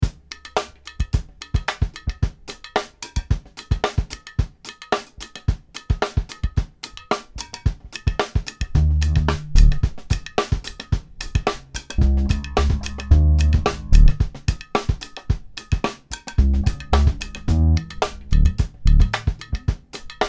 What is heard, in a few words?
Ibanez GIO Bass With Drums